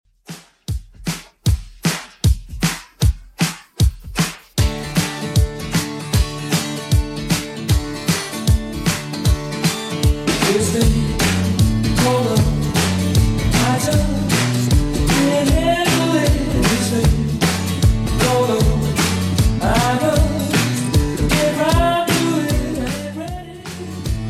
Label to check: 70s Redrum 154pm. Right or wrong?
Right